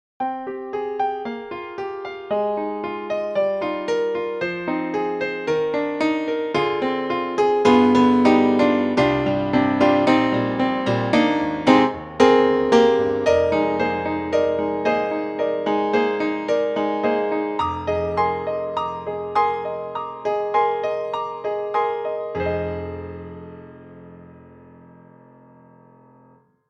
Concert Grand; Berlin; Native Instruments

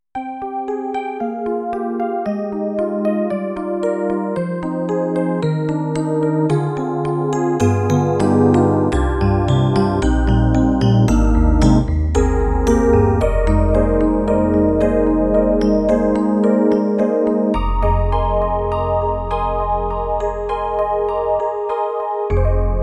EDIROL SD-90, Solo, FM Hard EP; EP Legend 3

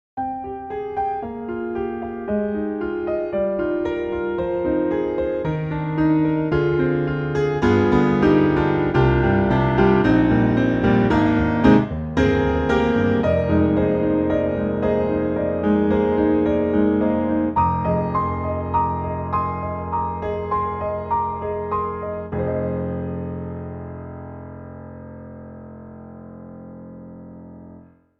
CFX CONCERT GRAND Classic Solo Piano; GARRITAN

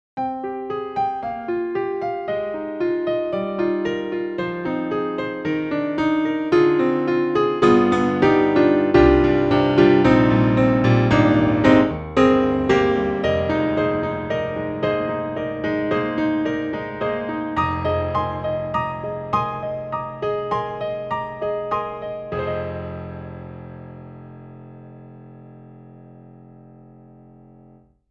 Italian Grand Power Ballad Grand; Synthogy; Ivory II

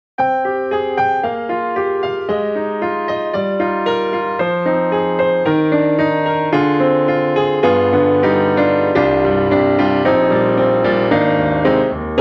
Studio Grand, Roomy Pop; XLN Audio; Addictive Keys

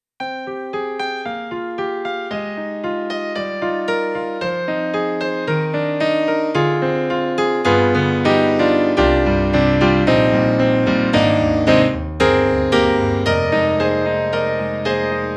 Korg Triton-Rack Acoustic Piano

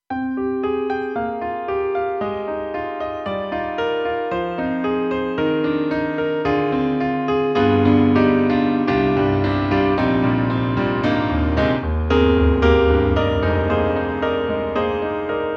Acoustic Piano; KORG M3 XPanded Program

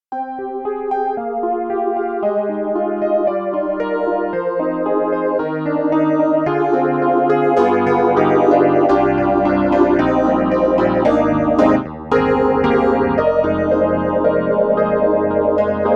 Yamaha CP80 Phaser Fast Phaze; Steinberg; The Grand 3

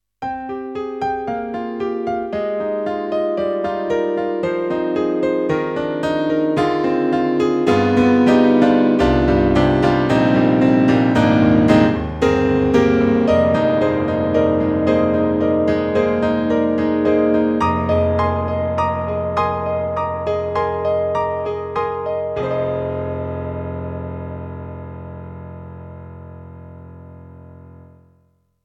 YAMAHA QY70 GM Grand Piano

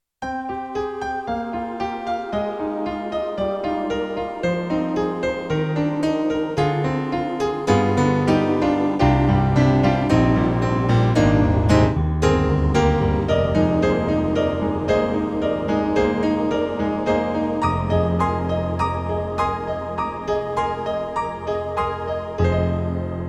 YAMAHA MOTIF-RACK ES Piano and Ooh

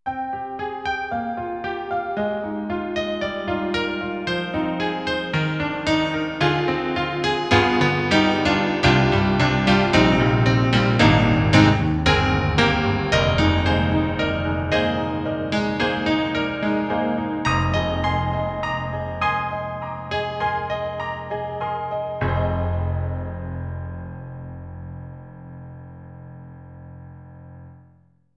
EDIROL SD-90, Enhanced, Brite Honky

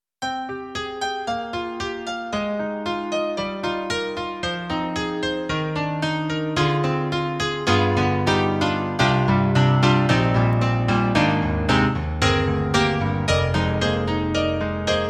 Piano; Roland; SonicCell; GM2

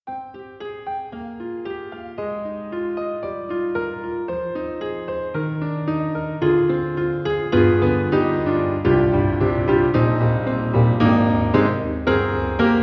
Grands Sustaining Grand; Orchestral Tools; The Orchestral Grands

